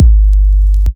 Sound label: Kick